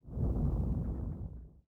boss Sphinx, monster

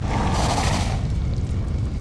queen idle, monster; lug

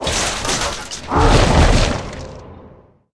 ghost soldier dead, monster

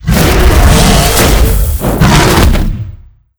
attack, monster; patren

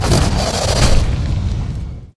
queen dead, monster

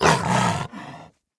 werewolf damage

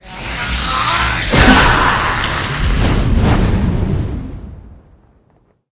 elder lich attack, monster

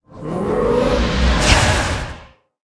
phantom archer attack

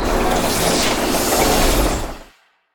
robotboss idle, monster

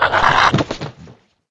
raven attack; monster

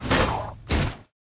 damage, monster

